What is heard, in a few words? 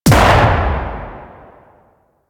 Sniper Shot; Warframe; Tenno; Quad